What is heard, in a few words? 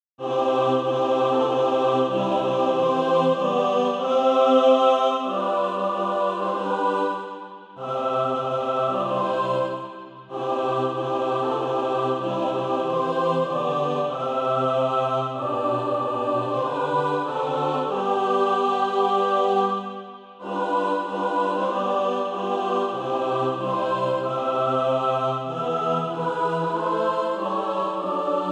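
mixed, Practise Music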